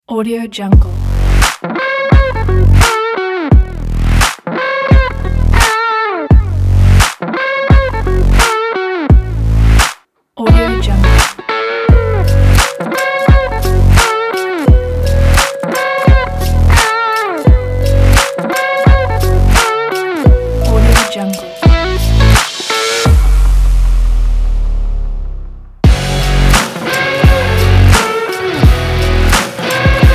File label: hip hop rock